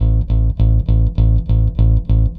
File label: BASS, ROCK POP; MAGIX